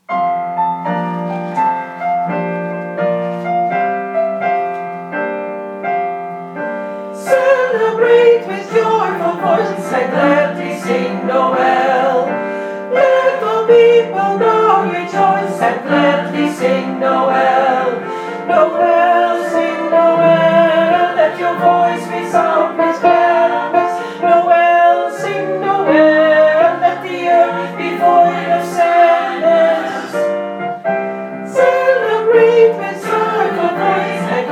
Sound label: mezzo